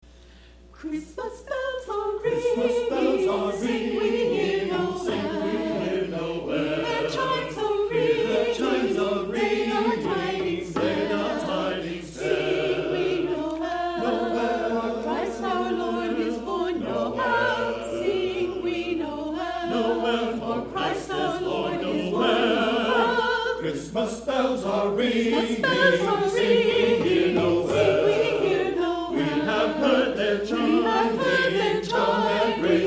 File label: Christmas Bells Are Ringing